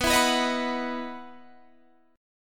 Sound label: E Major 7th Suspended 2nd